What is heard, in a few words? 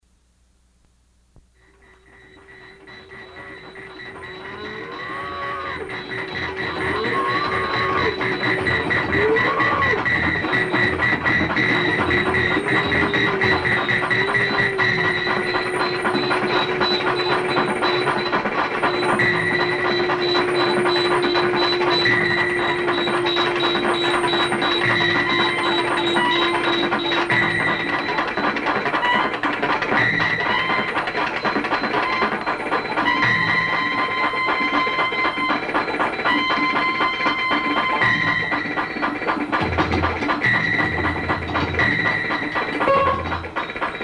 Hot Crickets